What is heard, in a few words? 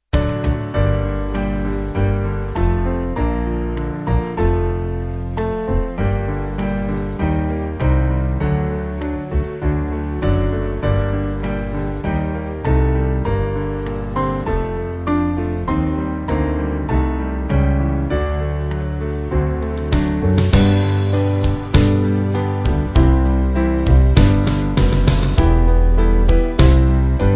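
Band, church music backing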